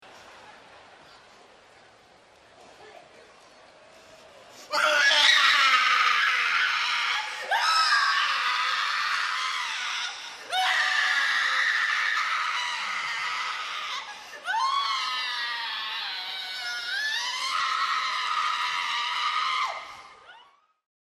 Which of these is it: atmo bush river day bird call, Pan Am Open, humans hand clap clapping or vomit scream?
vomit scream